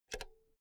insert cinch

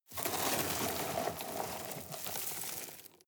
sweep small rocks soft

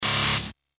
warning signal

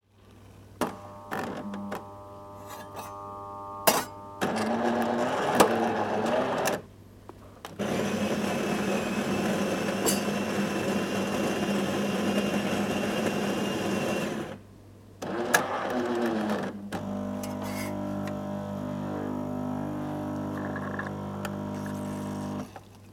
vending machine preparing a cup of coffee

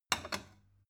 stone bowl shelve short

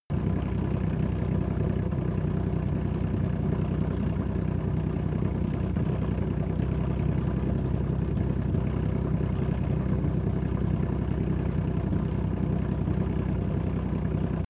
tank large engine medium speed steady

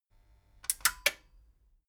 metal button stereo click power off slow

